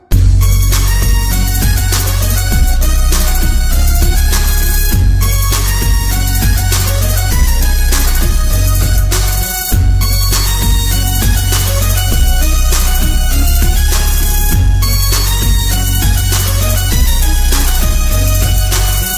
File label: Trap remix; TEM shop